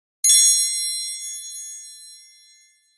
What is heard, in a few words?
Message, phonetones Nokia; E50